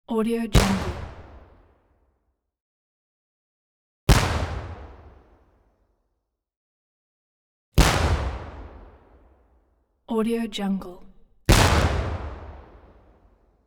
medium cannon fires with long echo; hollywoodedge

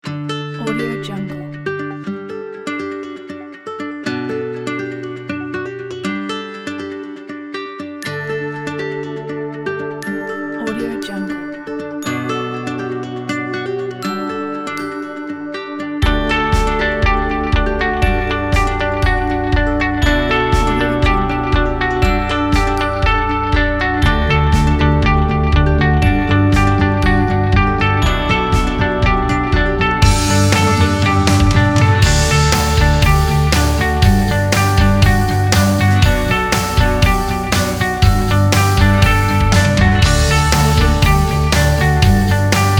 corporate background; corporate background pack

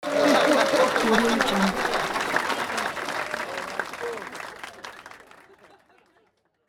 laugh and applause

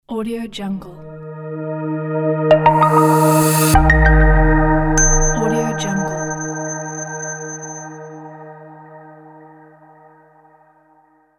flat smart logo pack

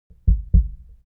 human body heart beat double; smartsoundfx

